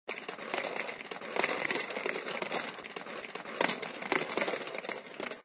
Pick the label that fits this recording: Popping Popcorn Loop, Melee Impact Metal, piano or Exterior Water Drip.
Popping Popcorn Loop